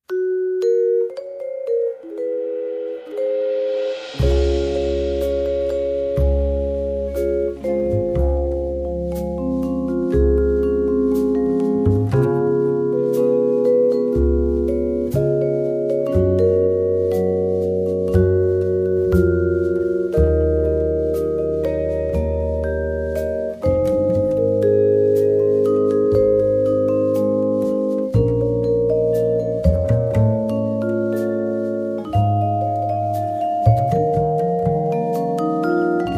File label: Vibraphone